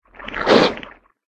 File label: shupaljtse attack voice bottom